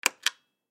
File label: Hotel Room Lamp Push Button On Off; Foley; Lights; Household; Blastwave